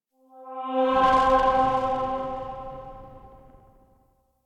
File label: UI Starchart Zoom Enter; DE; Warframe; Starchart Redux